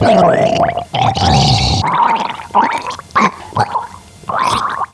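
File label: drowning male; DAoC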